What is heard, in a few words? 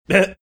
short laugh